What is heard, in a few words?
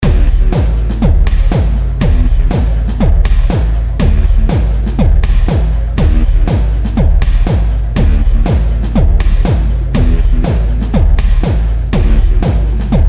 trance loop